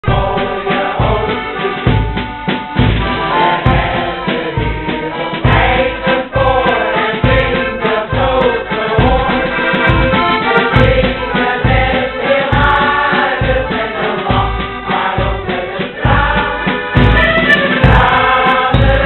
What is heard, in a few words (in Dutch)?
openingslied; Tranen met Tuiten Hoogvliet